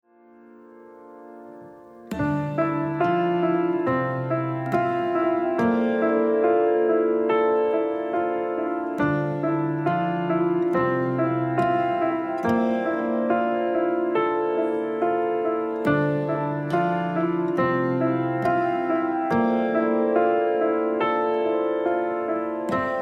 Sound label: rhythm